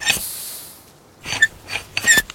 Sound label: flame reload